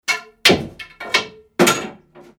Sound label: broomstick falling